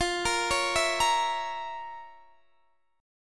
F Major 7th Suspended 4th Sharp 5th F, Guitar Standard; FM7sus4#5 arpeggiated; JGuitar